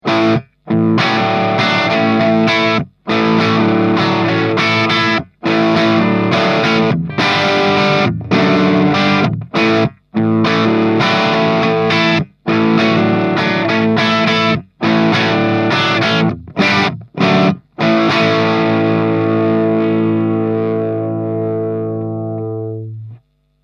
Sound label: Red Box Crunch